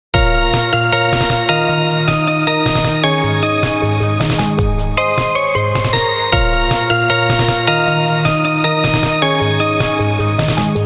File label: happy mood ringtone